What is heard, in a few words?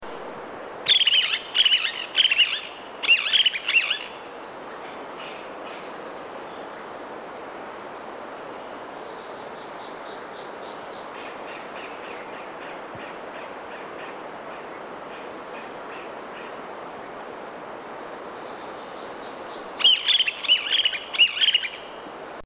Boat billed Flycatcher